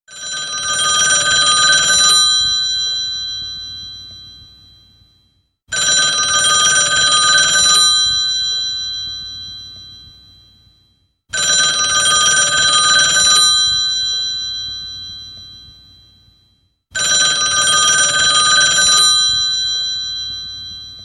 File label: telephone ghadimi; mobile; ring